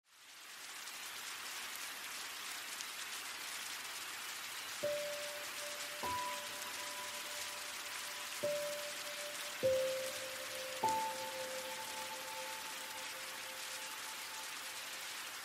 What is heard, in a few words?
rain relax music; آهنگ ملایم احساسی بی کلام خارجی